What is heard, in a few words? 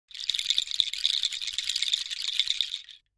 Shaker Bracelet Natu, percussion shaker